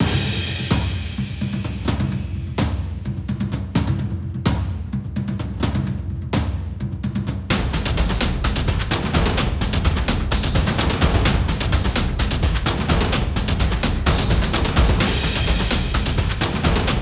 drum; By Burak Sound Bank; haberler news

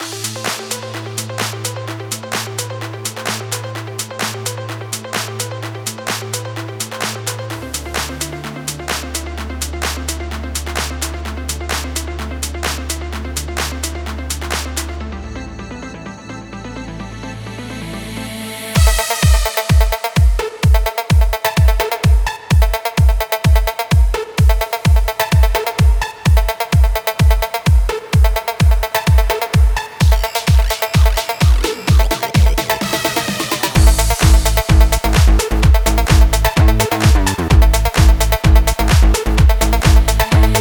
fullmix, house electronic techno; By Burak Sound Bank

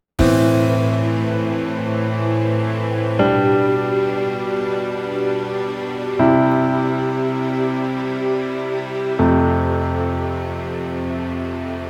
dramatic drum comp huzun; by burak sound bank; dramatic dram vol 16

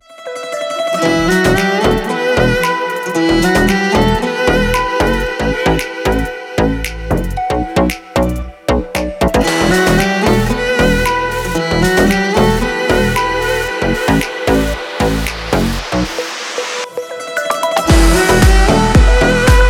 deep house; by burak sound bank; deep house vol 3

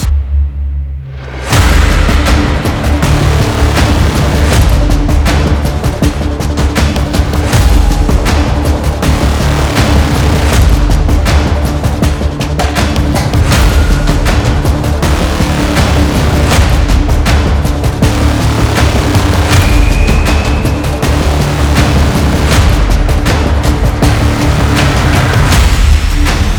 tension project; by burak sound bank; tension gerilim vol 36

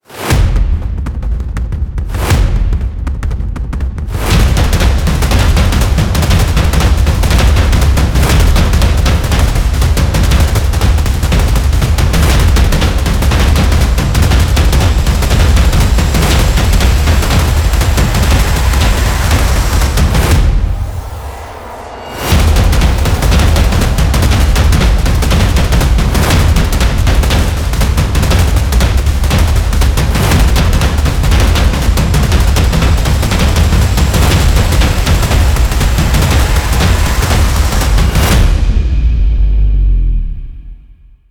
tension, horror excitement thriller song; By Burak Sound Bank

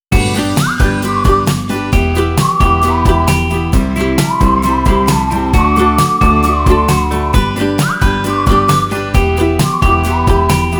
sweetness whistle; by burak sound bank; cheerful vol 3

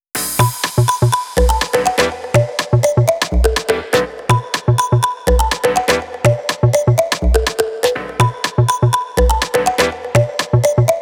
house electronic techno; sinyal mesaj2; By Burak Sound Bank; house electronic techno vol 6

